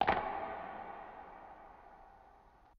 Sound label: Weapon Racket